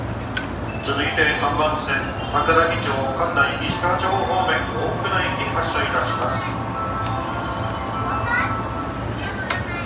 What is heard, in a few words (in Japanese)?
京浜東北線横浜南, train sounds